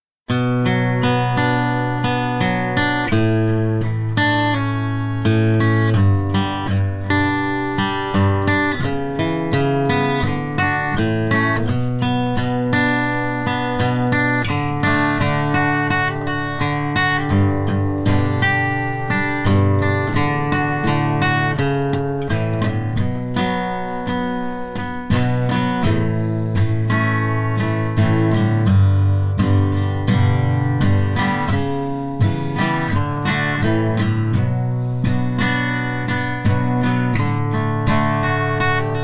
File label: male karaoke